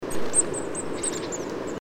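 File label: blue tit caeruleus, flight call; prerow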